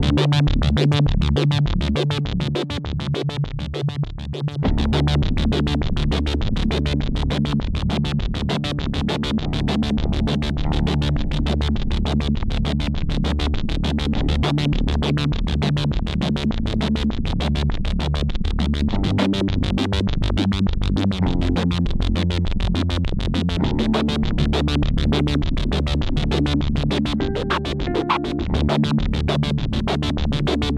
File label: Boss SY 1 SEQ